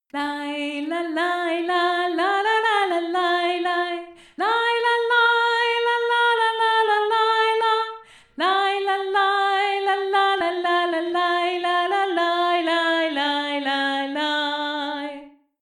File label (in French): Alto VOIX